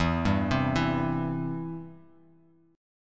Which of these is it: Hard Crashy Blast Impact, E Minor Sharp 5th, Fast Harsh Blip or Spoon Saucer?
E Minor Sharp 5th